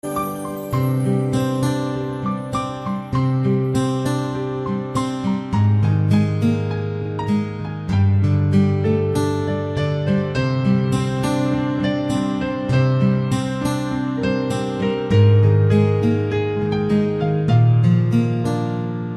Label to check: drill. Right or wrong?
Wrong